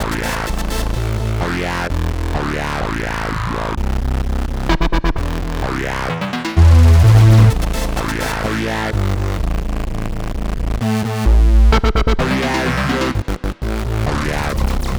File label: 128 Am Full Mix, Complex Electro Synths; Speakerbox; Prime Loops